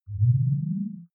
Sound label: game start deep, signale